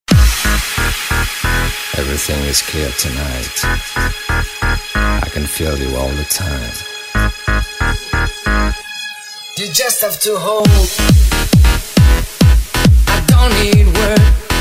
som automotivo

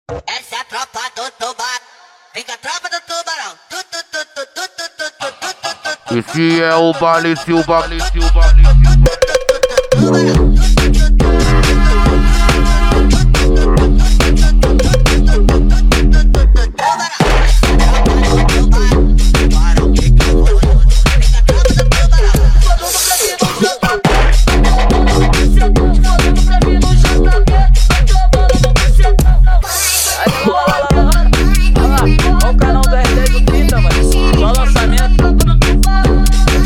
eletro funk

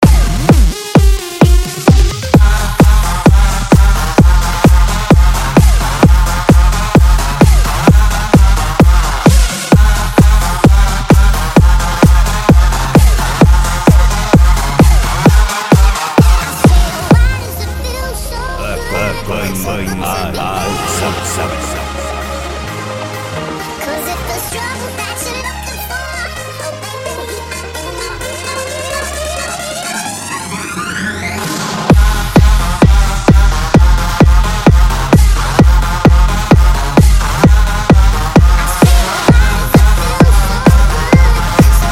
eletro pancadao automotivo

